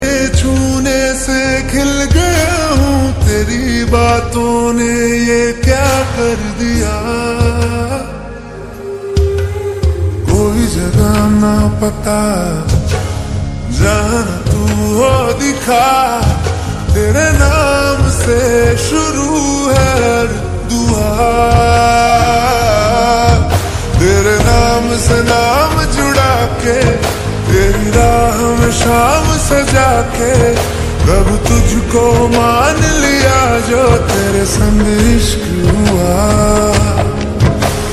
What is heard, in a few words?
Lofi